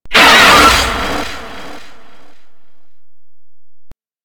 Golem Pain; Warframe

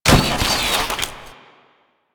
Military Backpack Destroy; Objects; Warframe; Storage Container

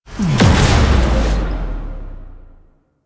Exalted Sniper Cast Impact; Warframe; Warframes; Frumentarius

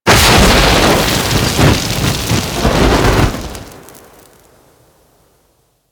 Flame Burst; Explosions; Warframe

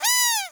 Baby Jump; Warframe; Nokko Colony; Visions

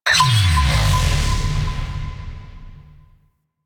Exterior Radar Machine Pulse; Props; Warframe; Railjack

